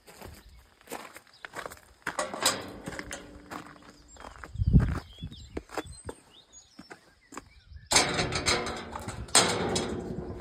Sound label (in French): PORTAIL CIMETIERE SORTIE; LE FORET DE TESSE 16